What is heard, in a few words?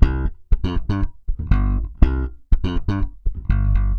bass salsa